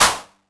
909, DIST; Wizoo - Platinum 24 Electronic; 909 DIST P2

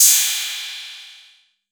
PERC 808

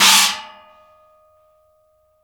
CHOKE, Drumworks; Sampleheads - New York City Drumworks VOL-1; SW KITS

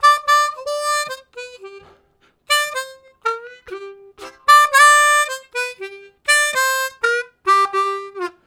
Going Down, Harmonica Riffs; Zero G

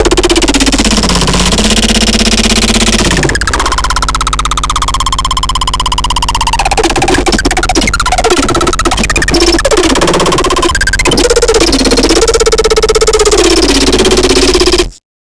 MORE DISTORTION PLEASE

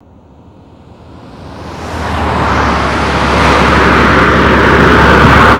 engine, Transportation; E-MU Producer Series Vol. 3 – Hollywood Sound Effects; Crash Engine

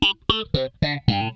FAZE PLUK, Funk Guitar; 90 s Sample CDs; Zero G

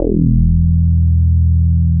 BOW BS E2

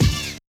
Yamaha DJX 2B, Hit

